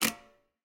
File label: Safe Rotate Back Stop